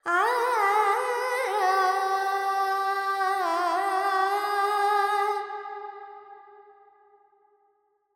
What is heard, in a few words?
Carnatic Female Alaps, Panthuvarali, 100 G, Verb; Sample Banks